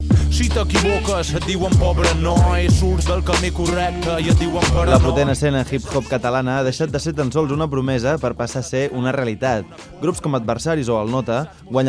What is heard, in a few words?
hip hop